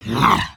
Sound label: idle, creatures organic